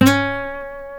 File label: C#4 nylon, Guitars